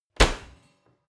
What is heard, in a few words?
oven close baking sheet ringing hard